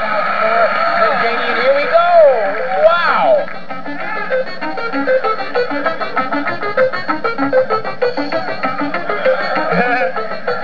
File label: laugh